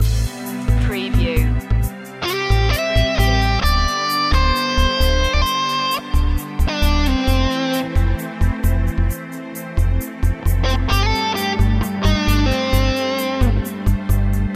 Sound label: ambition score loop